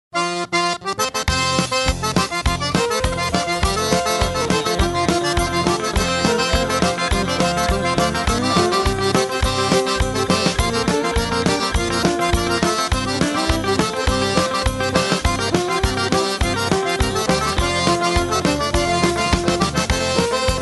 Cajun

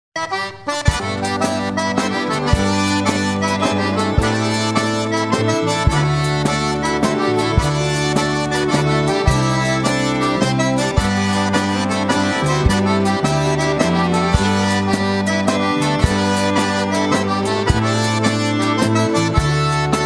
Waltz

